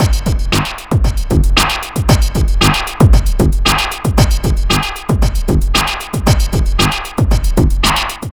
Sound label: Funky at 115 Panning, Drums Beats